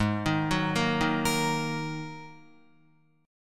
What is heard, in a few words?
G sharp 7th Suspended 2nd, Guitar; arpeggiated; chord; 3,6,6,3,3,6